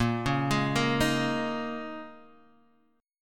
Bb Minor 11th, Bbm11 chord; arpeggiated; JGuitar

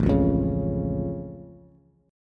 A Augmented A, Bass; chord

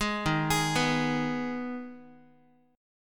D sharp Suspended 4th Sharp 5th, Guitar; arpeggiated; JGuitar

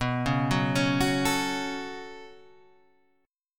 B 7th Suspended 2nd, Guitar, Standard; B7sus2; arpeggiated; JGuitar

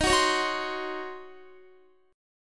Eb Minor 13th Eb down Guitar 4F,4A,5C,5G,5C,6E; Ebm13 strummed; JGuitar

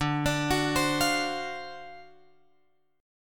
D 7th Sharp 9th D, chord

